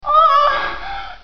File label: oooo AAAah